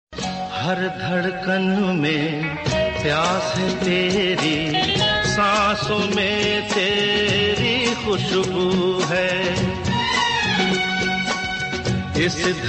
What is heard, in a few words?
Hindi song